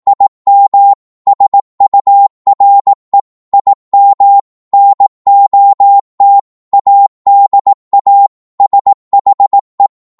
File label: 18wpm, Morse